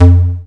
bass; instruments single samples

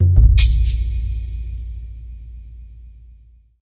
dark drum intro

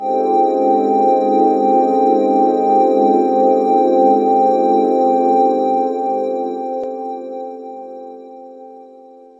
ethereal pad complex 7th; Pads

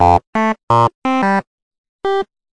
086 computer melody; SYNTH AND ELECTRONIC LOOPS

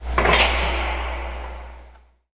forklift, Factory Percussion; UNUSUAL KITS and FX

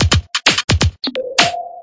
breakbeat sonar pingpong, drum loops and breaks

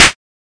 melbourne bounce Clap; Hip Hop; STYLE KITS; D3ron Melbourne Bounce Kit

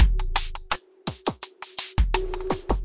84 bpm, breathy breaker, drum loops and breaks

